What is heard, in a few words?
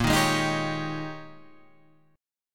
G sharp Major 7th Suspended 2nd Suspended 4th, guitar